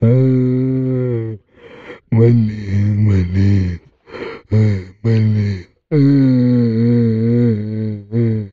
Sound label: zombie idle; STALKER; customnpcs